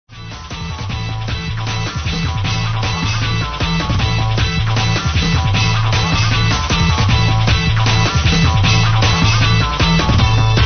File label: trap beats remix